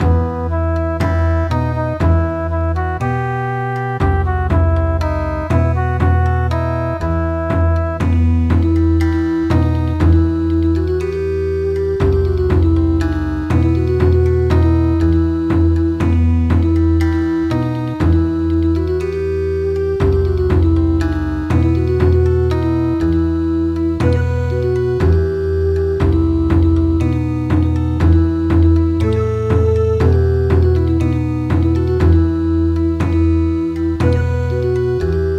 Bon Odori

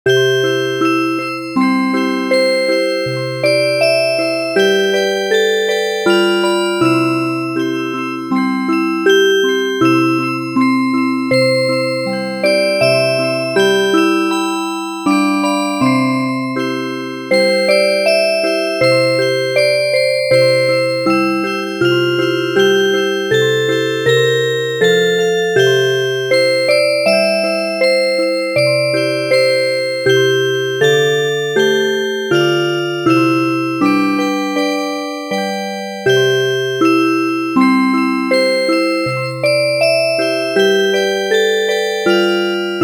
Music Box

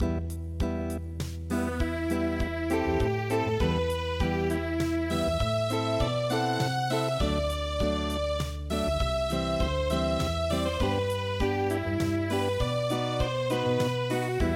Unplugged; midi; traditional

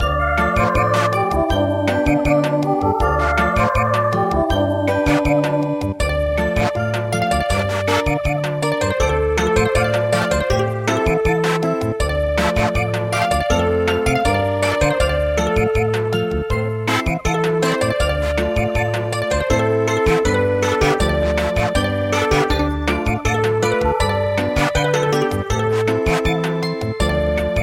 Samba, midi; traditional

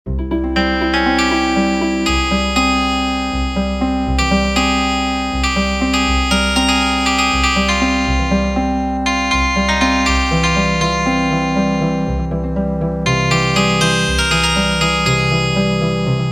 Healin Harp

